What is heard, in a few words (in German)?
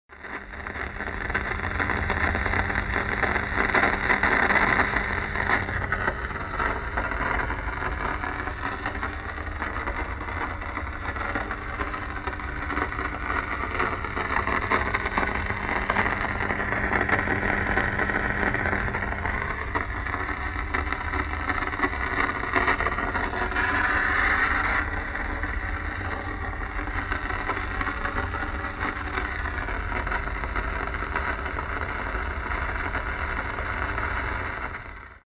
gegensprechanlage